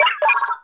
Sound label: Menu Error; RnC Sounds